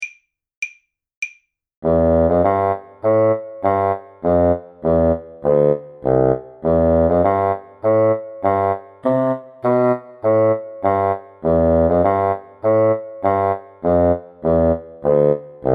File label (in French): marche soldats trio, basson